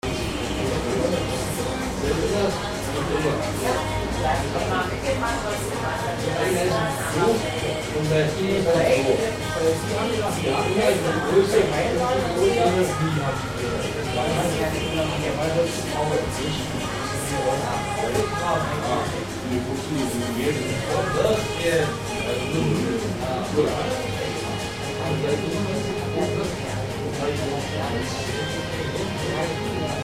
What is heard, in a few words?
atmo germany berlin pub; pub of refuge